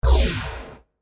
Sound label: laser; spacemap; npcLasers